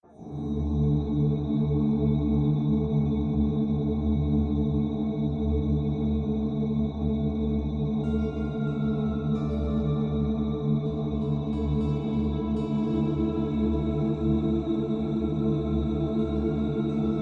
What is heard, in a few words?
Amb Sound Scape Deep ambience, Wood flute and Sitar; Ambient SoundScapes